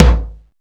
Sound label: drum c6, DRUMCOMPUTER YAMAHA R5+RX7; Best Service ProSamples vol.55; Retro Sampler